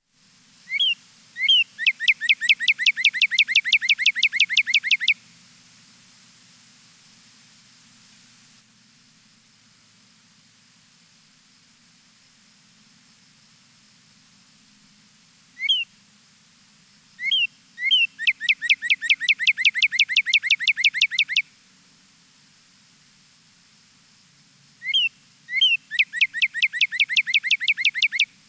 Cardinal wha cheer

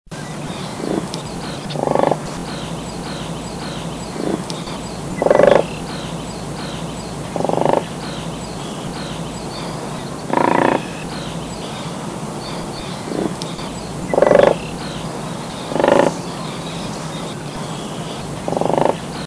crane; Brood; GEN2

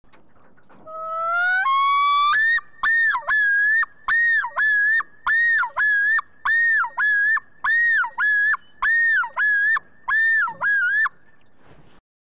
loon

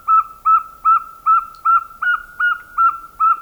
saw whet owl

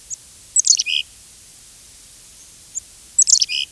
quiz call

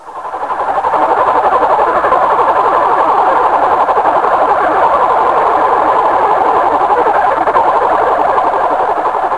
Bear Cubs Nursing

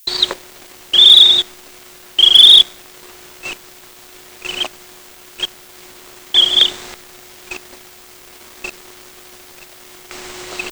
W Crane Pipping; Patuxent

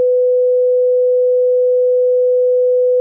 Tone 500Hz Half

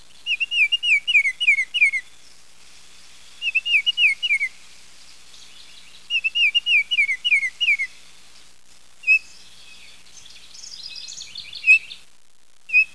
oriole baltimore

